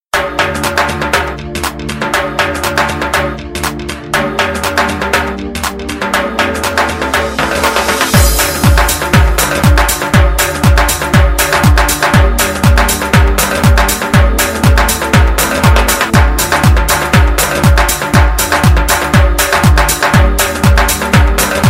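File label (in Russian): барабаны дагестанская лезгинка; barabany dagestanskaya lezginka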